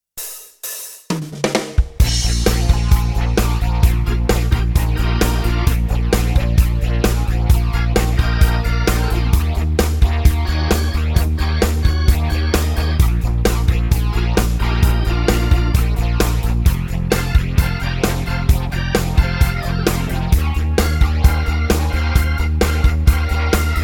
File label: rock